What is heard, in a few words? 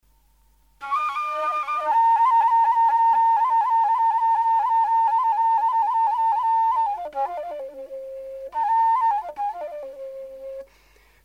umwirongi, rwanda